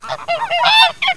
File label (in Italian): gallina; animalandia